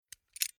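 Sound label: Tools Monkey Grips Opening Metal Movement Clicks; Hand Tool Sound Effects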